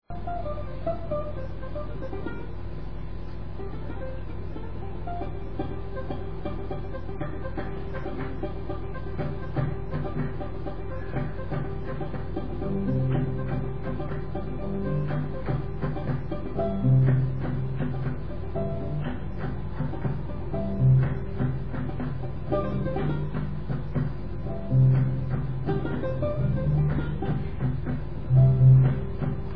ngoni; Etnologia

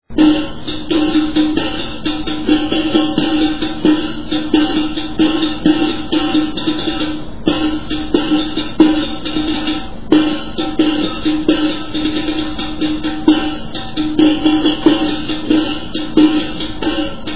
riq; Etnologia

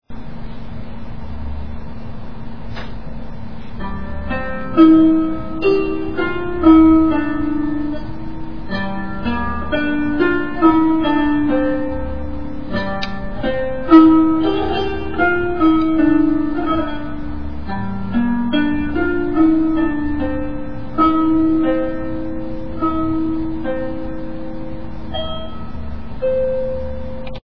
qanun, Africa musica; Etnologia

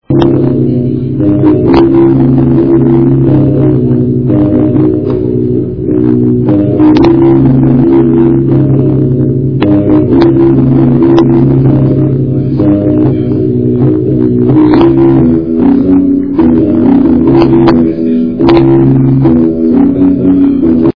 beganna; Etnologia

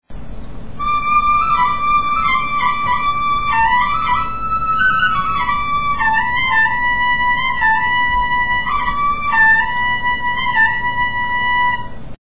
ghaita; Etnologia

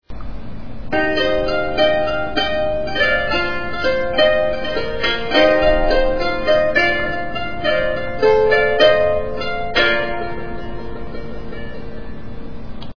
valiha, Africa musica; Etnologia

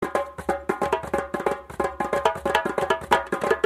djembe, Africa musica; Etnologia

